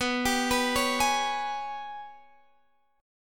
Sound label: G sharp Minor Major 7th Double Flat 5th